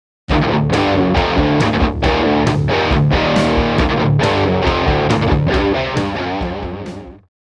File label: Drums